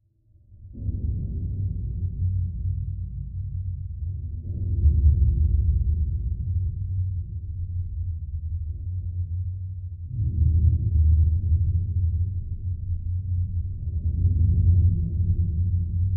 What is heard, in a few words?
Underwater Amb Metal, metal groan atmospheres; Underwater Ambience Metal- Low Impacts